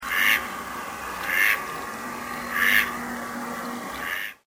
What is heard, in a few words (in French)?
Canard colvert male